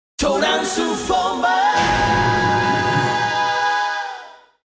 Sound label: Commercial Bumper Sting